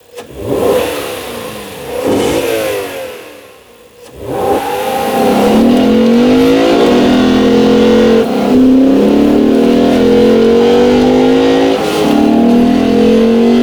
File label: Engine Sound